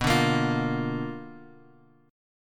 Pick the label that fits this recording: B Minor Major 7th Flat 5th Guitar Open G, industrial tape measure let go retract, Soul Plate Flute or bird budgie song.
B Minor Major 7th Flat 5th Guitar Open G